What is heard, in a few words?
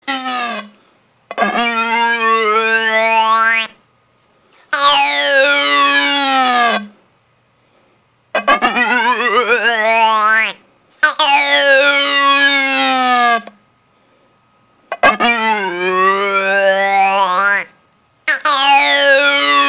Moan